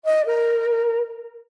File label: Windows Notify